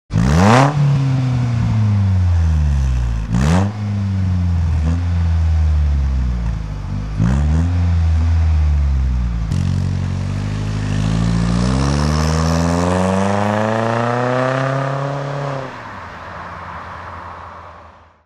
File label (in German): Sound im Stand plus Wegfahrt